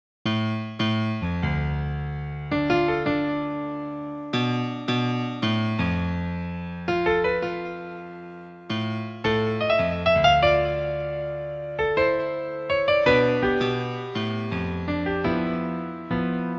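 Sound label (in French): Répétition Piano; Majora's Mask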